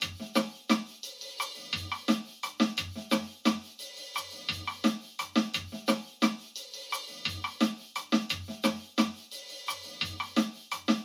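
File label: drums, 170° (max. possible shift); audio technology group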